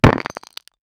stone chunky; minecraft stone chunky

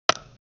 arrow hitting target; archery

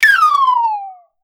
in a retro game a

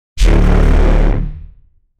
dark trap braam demonic

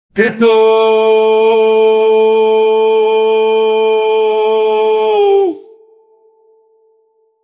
a brass section and happy

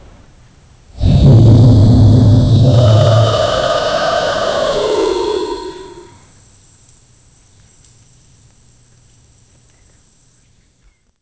dragon roars flaps its

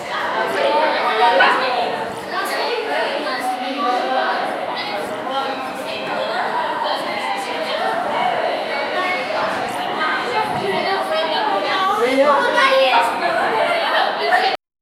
the sound of crowded scho, busy

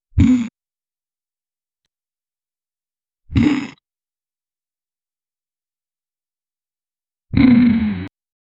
failed sword extraction, stone grinding

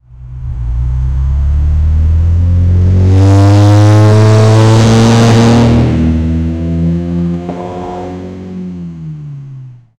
acceleration sound full s, sports car

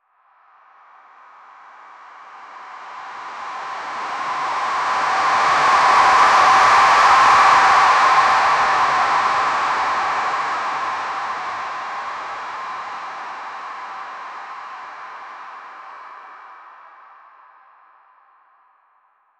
transformative soundscape; reverse

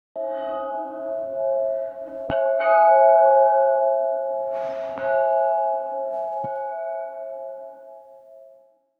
musical-instrument, sound-effects

